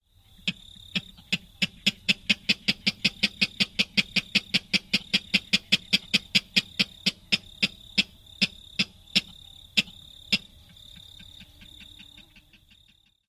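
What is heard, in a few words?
excited kek calls, Clapper Rail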